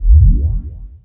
Menu Low Tone; Menu FX

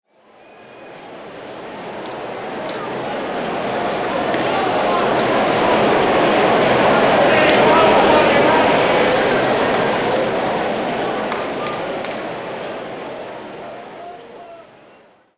Crowd Ambience; Pitch Black Audio Pong

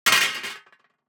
DICE on Metal, Throw and Roll, Metal, Two Dice; Samples; ArticulatedSounds; DICE Sfx Party (Extended)

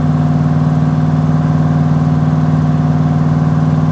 68 Road Runner Loop, Automobiles

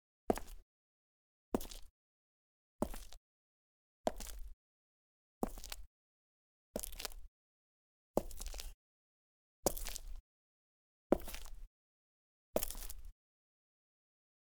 Cement Gritty, Foley Footsteps; Sound Ideas

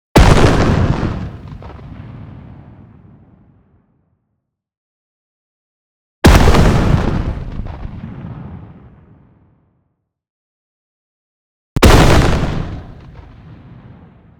EXPLOSION LONG Cannon Shot; boom library; Anime Essentials

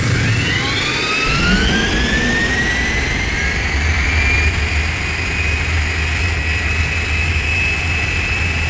Plane Jet Powerup Loop; Airplanes

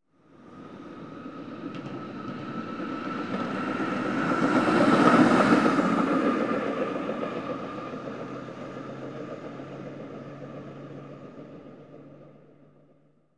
Streetcar Passing; KMP Film Video Sound Effects

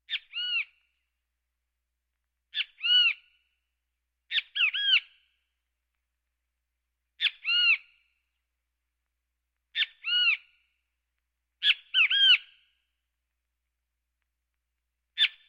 Birds

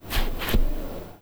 slide

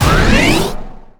Pickup Scifi Energy; SFX Kit

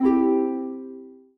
PUZZLE Success Harp Three Note Fast Wet; stereo; Universal Sound FX

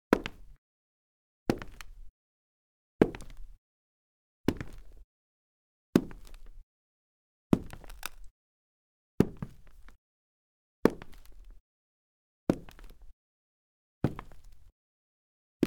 Wood Soft, Foley Footsteps; Sound Ideas

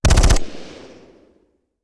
Machine Gun; Sound Ideas; Guns

